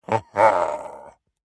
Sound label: Smash Wind Up; Baron